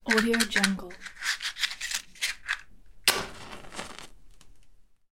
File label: matches